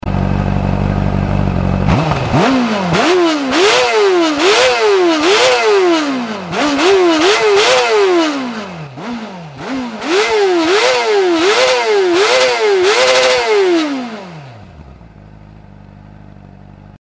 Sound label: cbr600rr